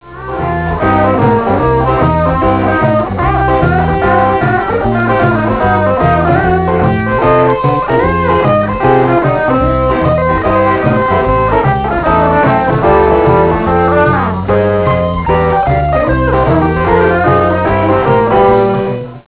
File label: shuffle